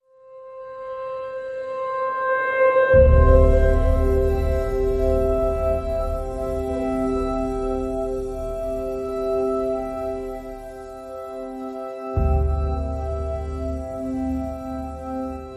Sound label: Percussion and Synths